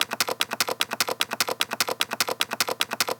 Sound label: Percussion Loop, Percussion Foley, 150bpm; Dubstep; Percussion Foley Loops; Ghosthack; Dubstep Sound Arsenal